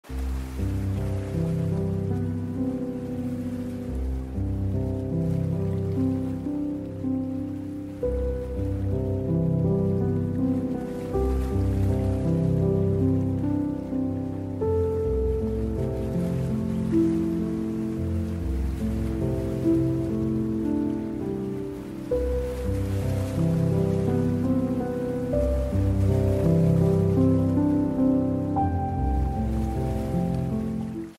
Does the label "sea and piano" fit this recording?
Yes